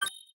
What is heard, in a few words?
Shutter; Sony Ericsson Xperia Active; camera